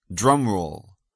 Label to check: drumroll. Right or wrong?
Right